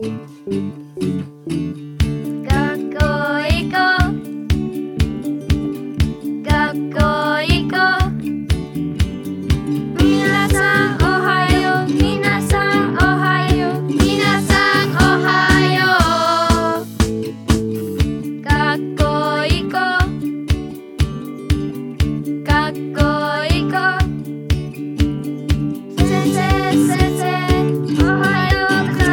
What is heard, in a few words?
song japanese